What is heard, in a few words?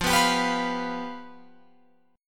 F Suspended 2nd Flat 5th, Guitar, Open F; Fsus2b5; strummed